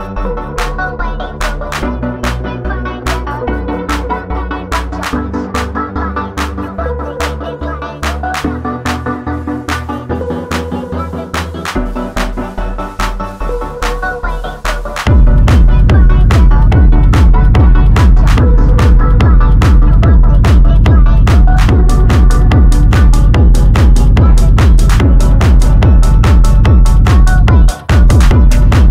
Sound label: beautiful girl techno